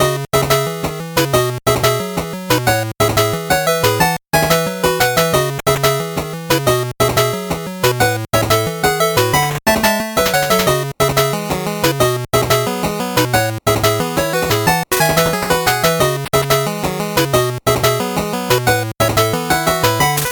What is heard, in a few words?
8bit bgm normal